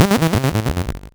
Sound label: lose; kenney desert-shooter-pack 1.0